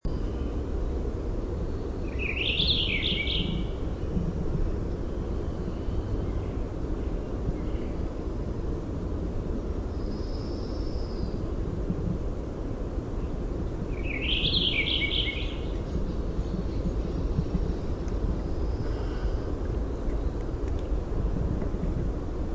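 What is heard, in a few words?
Warbling Vireo song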